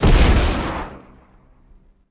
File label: rico hangar Laser; impacts explos